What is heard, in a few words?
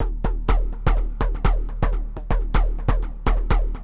HOUSE 125; eJay